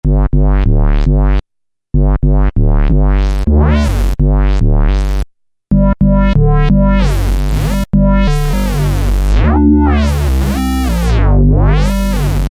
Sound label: Korg microKORG Reverse Bass; Factory Voices